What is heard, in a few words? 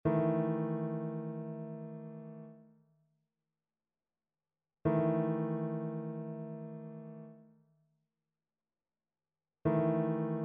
trichord; trichord identification